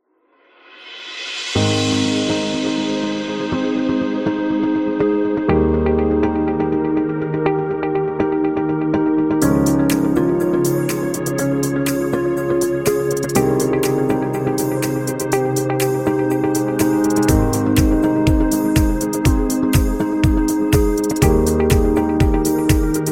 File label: Luxury Commercial